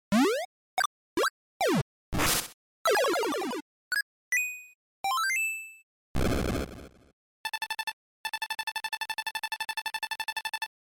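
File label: Game Boy Action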